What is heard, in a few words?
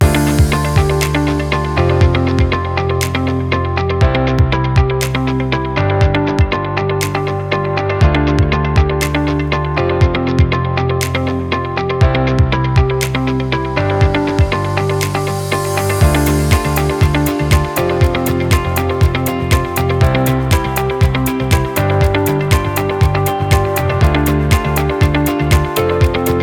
Motivational Momentum Upbeat Motivational Corporate Technology Loop